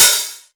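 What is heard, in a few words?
Hat Open, Novation Drumstation; Novation DrumstationV1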